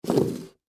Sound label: Gift Box Push Away Slide Fast; Foley; Blastwave